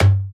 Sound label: Percussive